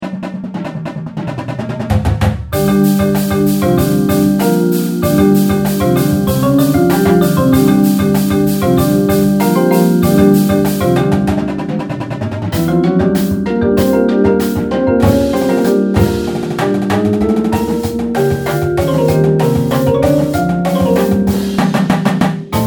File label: Frankenstein PERC; HALLOWEEN